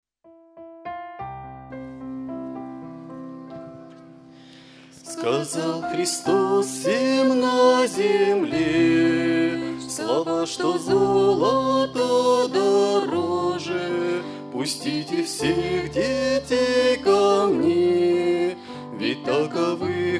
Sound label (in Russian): пение; Для семейных; Лагеря; 2016 Семейный лагерь в Краснодарском крае